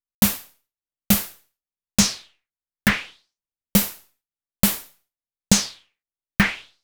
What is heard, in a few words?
Anabeat Snare